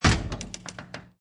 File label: Cellar Door Open; Miscellaneous Door Sound Effects; Lynne Music